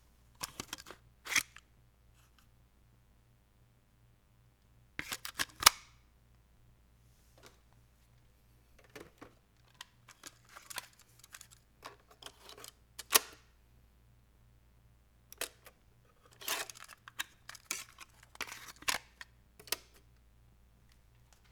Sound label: Cassette sequence